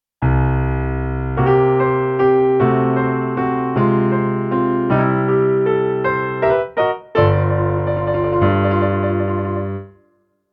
Blues Introduction Key of C

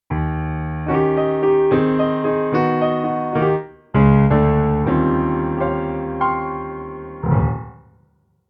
Blues Ending Key of Eb

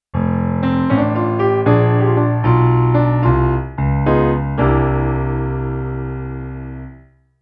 Blues Turnaround Key of F

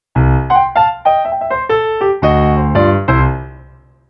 Blues Ending Key of C

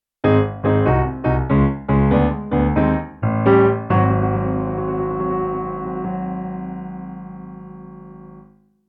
Blues Ending Key of Ab

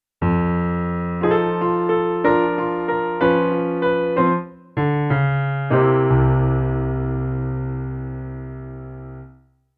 Blues Turnaround Key of F

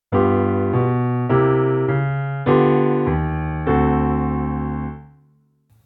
Blues Turnaround Key of G

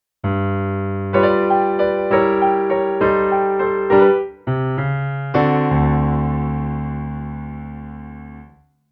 Blues Turnaround Key of G

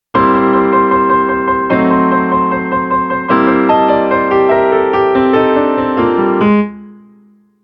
Blues Introduction Key of C

